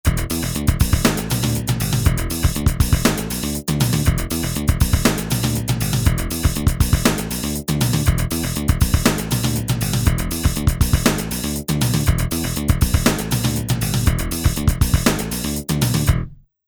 Fusion

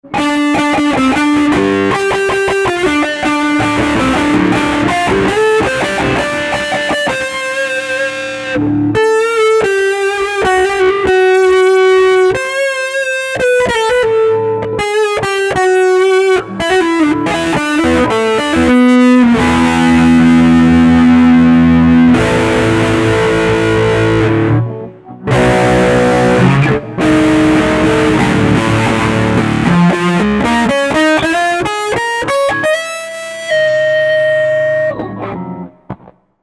POWER LEAD

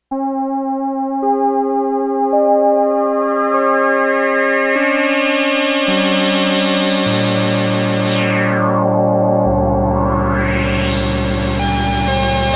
Sweeping Harmonics

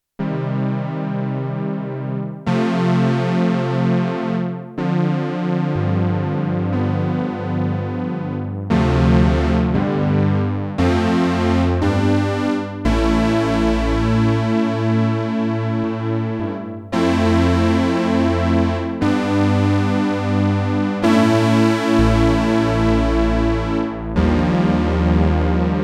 Korg DW-8000 MELLOW SYNTH, Factory Patches

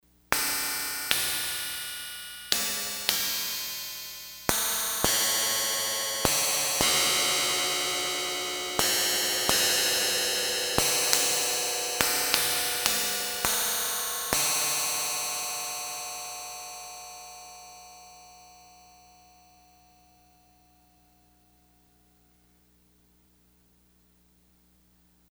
Synballs, Yamaha DX100; Factory Patches

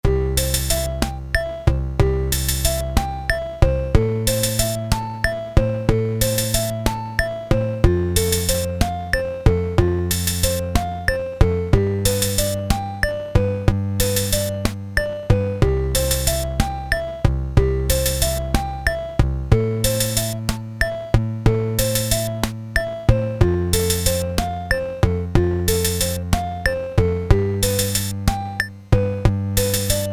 slow rock, Casio SK-1 Rhythm

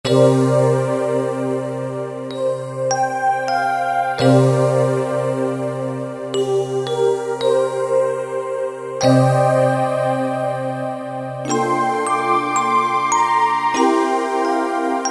Sizzl E Pno, Kurzweil K2000; Kurzweil K2000 Contemporary ROM

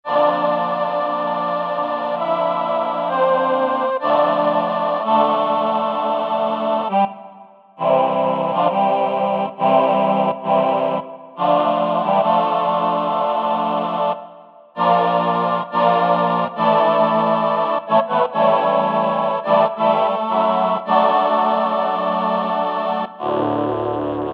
Roland VP-330 Choir; synthesizer; Guess the synthesizer game

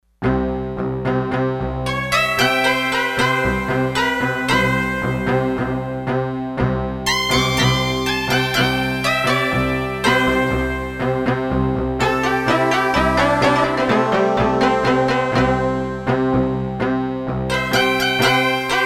Ethnic Session, Roland D-10; Factory Patches

